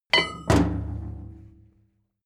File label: metal tool drop massive; soundpool